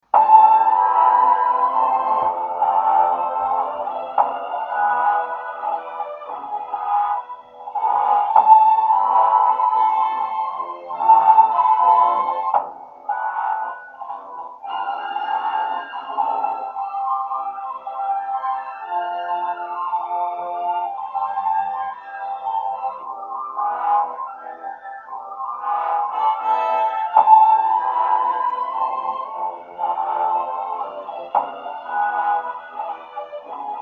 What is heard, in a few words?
philarmonia orchestra sequencer